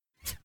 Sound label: Futuristic Bullet Flyby